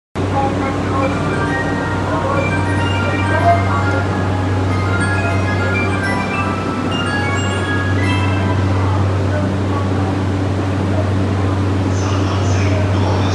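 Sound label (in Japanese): 山手線 大崎 clockwise, train sounds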